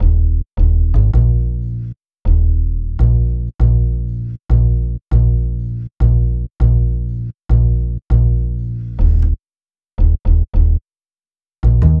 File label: Bass, Hip-Hop